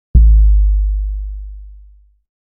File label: Subkick